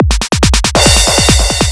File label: club 140 fill